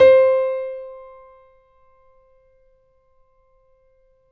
Grand Piano MF C4; AKAI S6000 CD-ROM - Volume 2; Grand Piano 256M

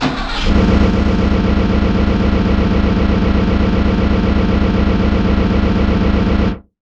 Car Engine; Roland BK-5; FX

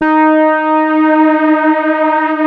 FAT LEAD, Powered DX; Wizoo

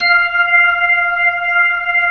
B-3, Jazz

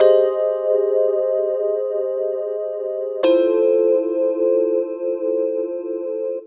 Piano Classic, Drum & Bass Keys; Sonic Foundry (Sony Creative Software); Knutrix Drum & Bass

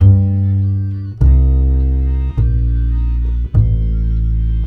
MEH2 BASS

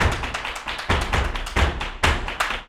BULERIAS TAP, Flamenco

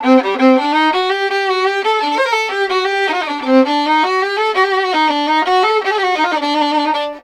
VIOLIN, 132, Balkanish Traditions; USB Soundscan vol.63; AKAI

